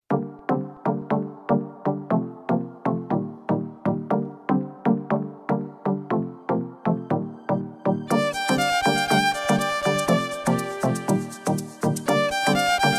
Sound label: electric violin cover